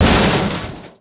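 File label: machine wreck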